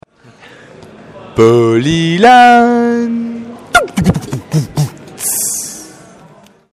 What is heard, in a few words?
jingle